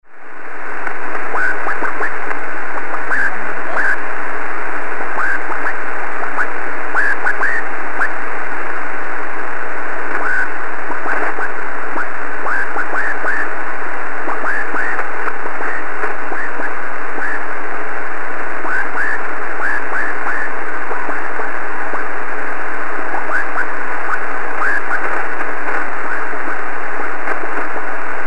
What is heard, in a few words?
Different Chirp, sounds and personalities of cw and transmitters; CW Learning Programs